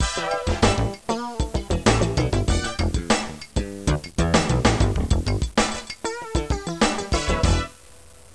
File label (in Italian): musica newage